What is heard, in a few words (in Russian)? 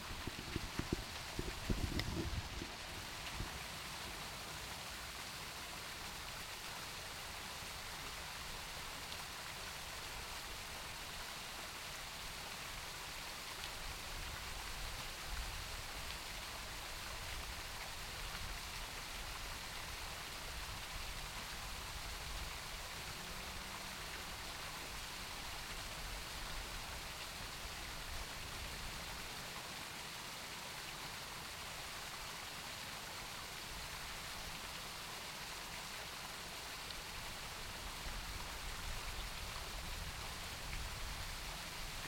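запись фонтана с помощью микрофона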